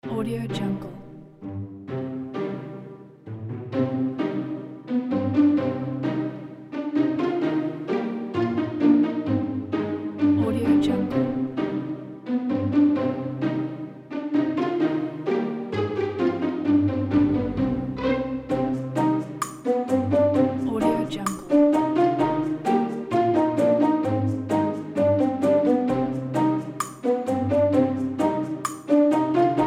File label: pizzicato comedy